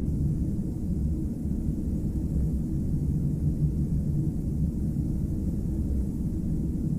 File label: galley, ships rooms